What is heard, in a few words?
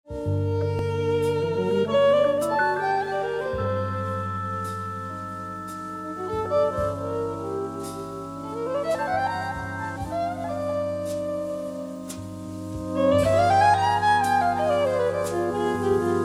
Delsbo Waltz orig Solo